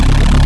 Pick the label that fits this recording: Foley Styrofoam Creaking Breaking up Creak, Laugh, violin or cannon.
cannon